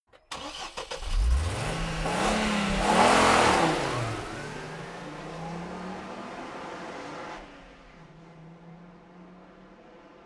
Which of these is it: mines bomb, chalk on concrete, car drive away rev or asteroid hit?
car drive away rev